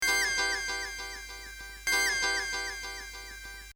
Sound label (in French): guitare; bellevue; Loop Mania Sampler Pack